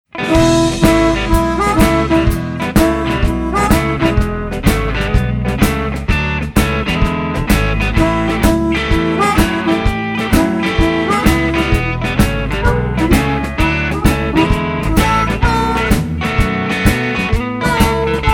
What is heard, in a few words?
harping boogie